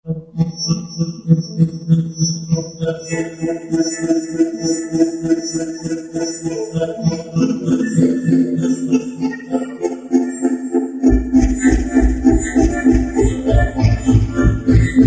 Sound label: Lover Dance, Roland JD-990; Factory Patches